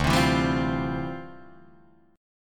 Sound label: D Minor 11th D down Guitar Drop D